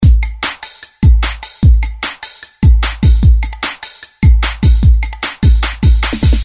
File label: rhythm electric guitar loop; Game loop rhytm; Sound Graph